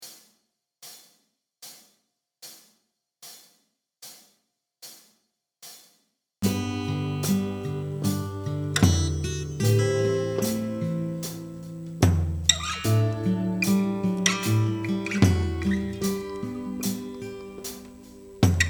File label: Acoustic Track, Guitar; Guitar Control